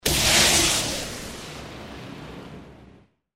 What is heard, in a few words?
Sci Fi Energy Bolts Electricity Electrical Beam; Laser